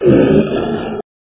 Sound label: stone dog death